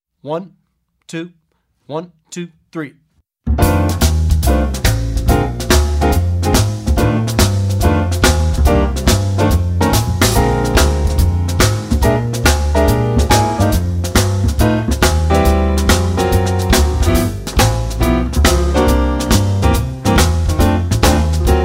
Jazz Bari Sax Blue Note Blues without bari sax soloist; Effective Etudes For Jazz Bari Sax